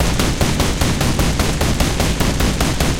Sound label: loop kwk30, guns tanks